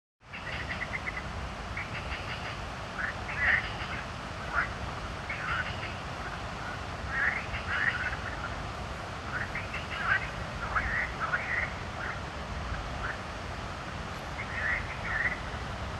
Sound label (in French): eau oiseaux et grenouilles